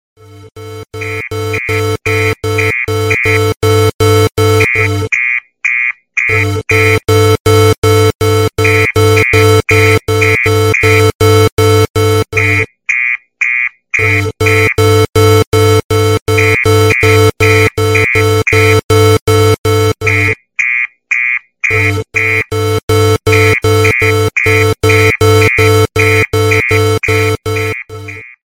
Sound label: s5 alarm clock